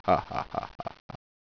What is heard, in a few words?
HAHAHA; DOOM